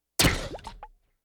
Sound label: comic weapon blast liquid burst squirt drip